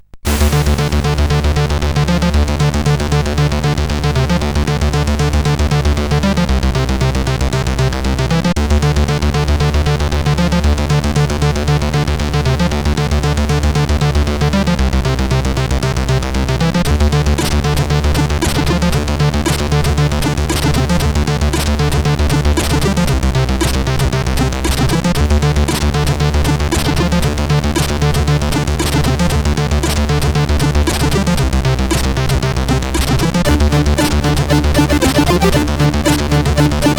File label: Theme, CSG8580R5; Unit A